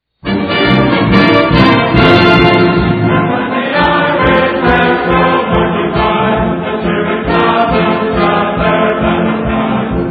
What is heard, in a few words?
Fight Song; Notre Dame Fighting Irish; When Irish Backs Go Marching By; NCAAF Football